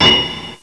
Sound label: ANVIL